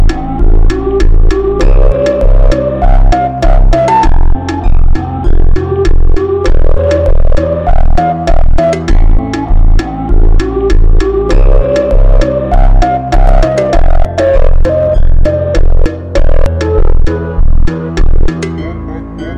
FUNK SUPER SLOWED

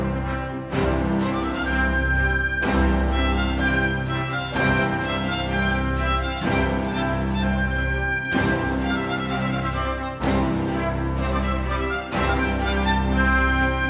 Toccata

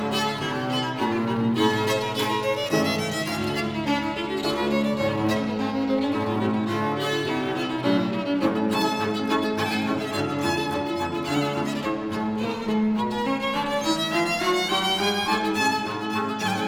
Fandango

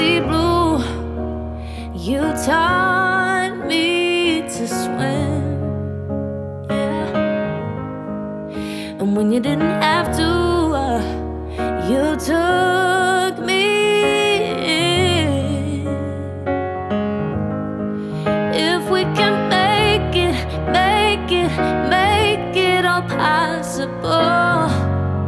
Acoustic; R&B; Соул

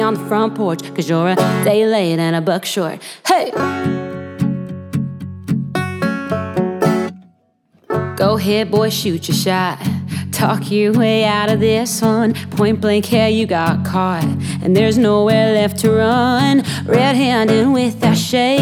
Acoustic

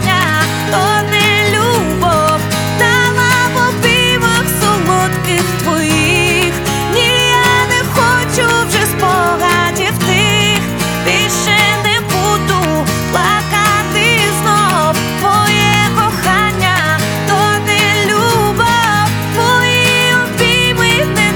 Acoustic Version

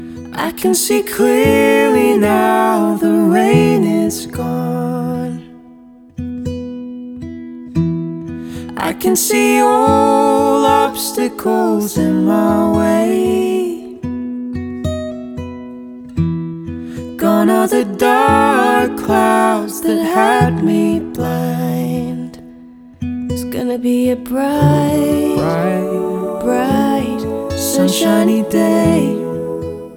Acoustic

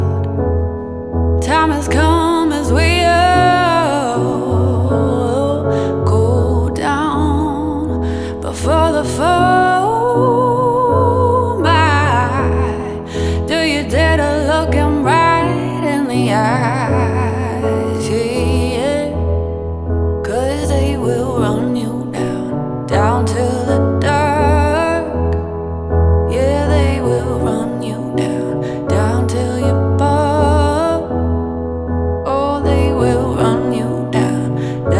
Acoustic Version

